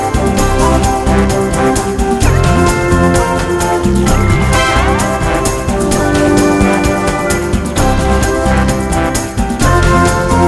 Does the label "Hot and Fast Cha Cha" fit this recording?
Yes